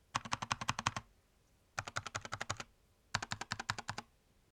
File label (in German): Tastatur Leertaste Back Enter